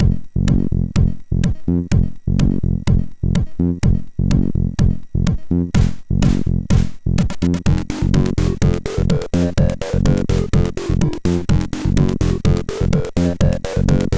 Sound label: Brutal Anty Techno; sid; MOS6581R4; Moog